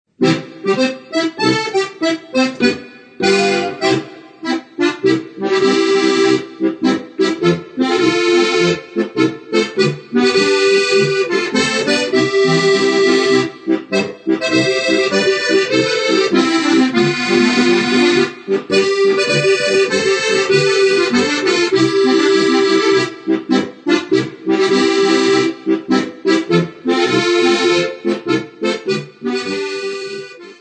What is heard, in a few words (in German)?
Edelweiß Schützen Marsch